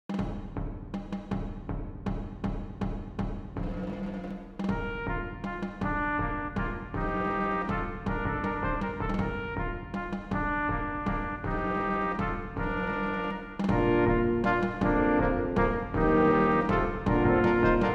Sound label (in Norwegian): Menuet for messingkvintett (ad lib trommer ); Aamodt